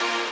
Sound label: lead